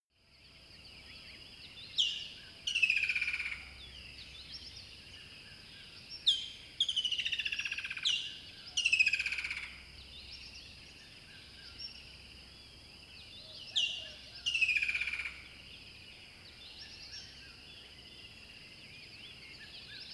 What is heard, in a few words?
river bed dawn bird call